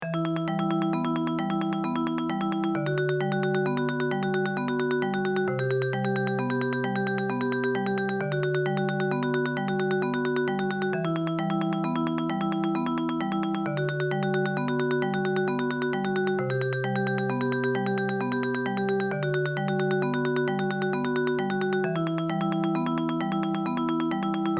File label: very easy etude for two tremolos